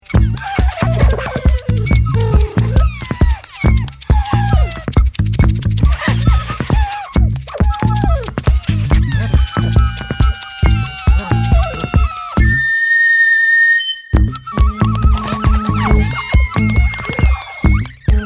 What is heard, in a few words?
vegetable orchestra; scoville